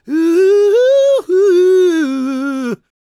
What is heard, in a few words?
GOSP MALE, SOLO; Vocal Planet Vol1 Gospel